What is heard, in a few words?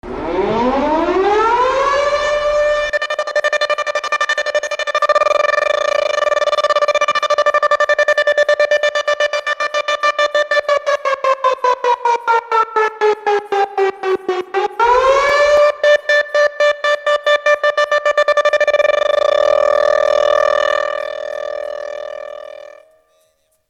Chopped Horn